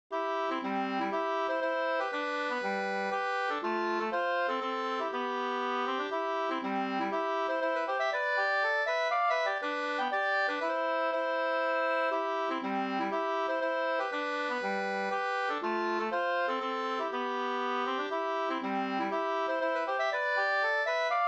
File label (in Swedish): polska Bondpolska; Polska från Överhärde, bondpolska från Gästrikland